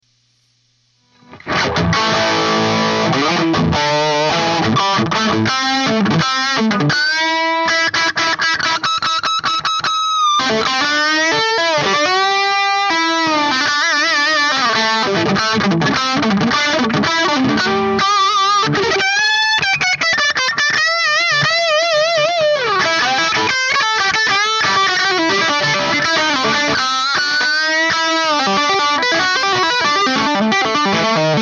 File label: Plexi Noodle dry